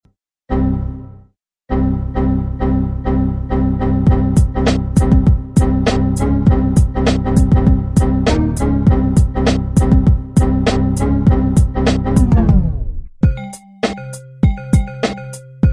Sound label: windows music